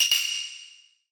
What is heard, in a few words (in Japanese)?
hyoushigi